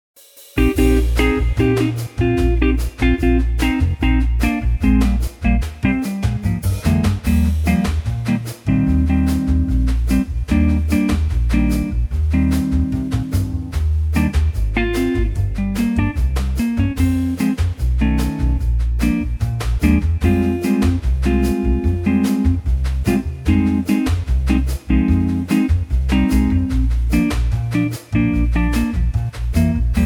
Eb guitar trio; Bossa; key - Eb - vocal range - Db to Ab; Lovely jazz guitar trio arrangement; with a light bossa nova feel.

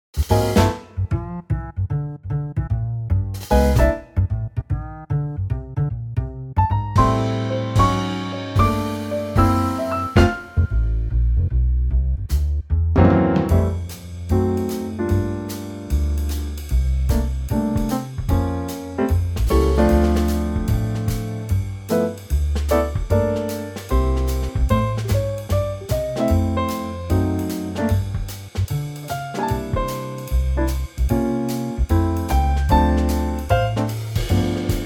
F trio

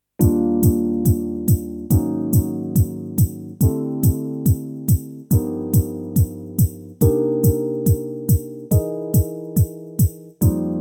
Bb smooth; key - Bb - vocal range - F to Bb; Livetrak Smooth arrangement; Around 140 bpm, with a cool Rhodes piano

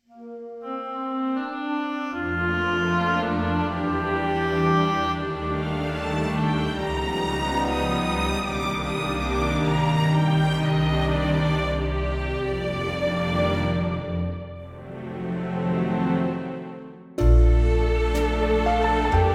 orch Bb

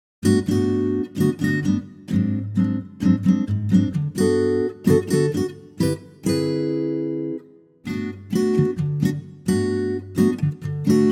Ac Gtr G

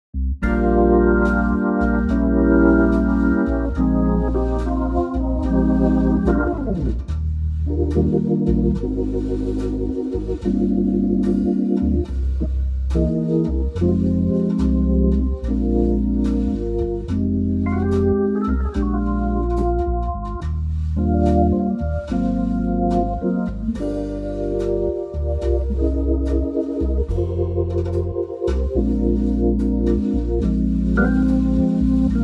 organ Eb

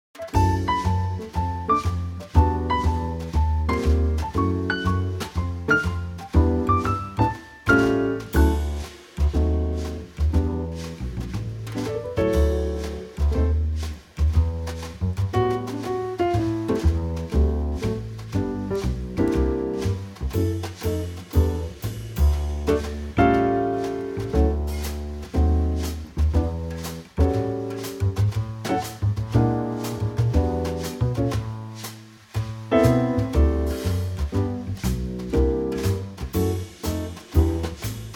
D Trio

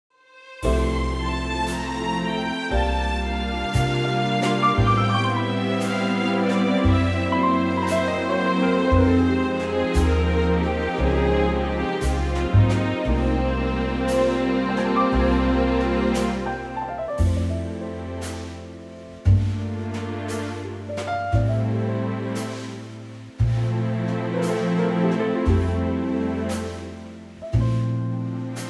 F orchestra; key - F - vocal range - C to D; Gorgeous orchestra arrangement

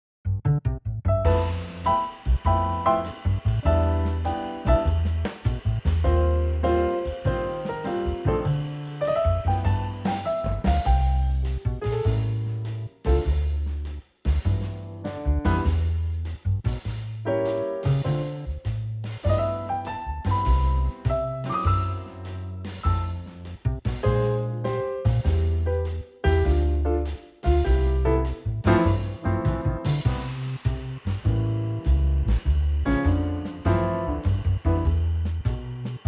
C trio; key - C - vocal range - E to A

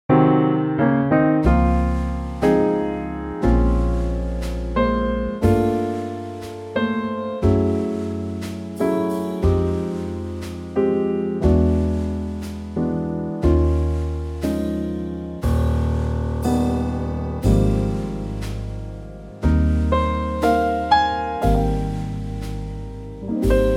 Ab trio

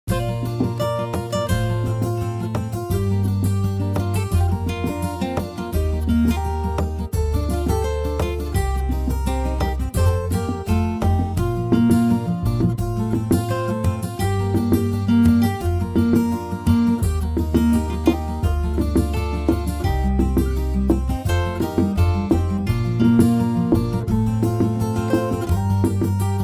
Bb C guitars; key - Bb to C - vocal range - G to A; Wonderful arrangement of this exceptional festive carol using acoustic guitars for rhythm, bass

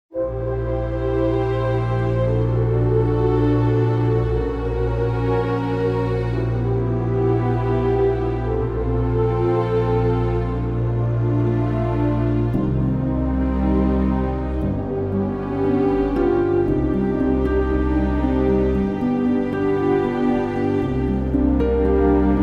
Bb orchestral

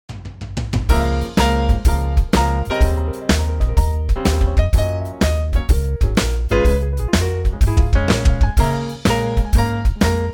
Db Trio

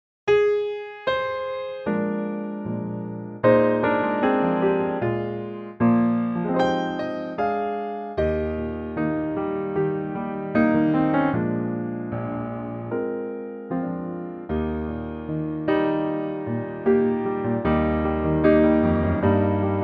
piano

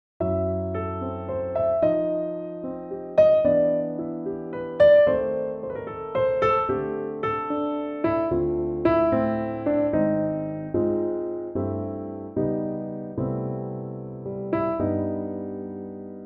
piano; Pianotrak; key - C - vocal range - C to D; works great as a "saloon song". 2015 piano only arrangement

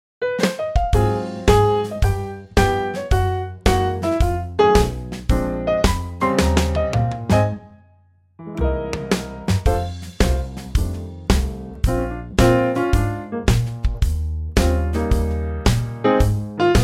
Trio Ab; key - Ab - vocal range - Bb to Db

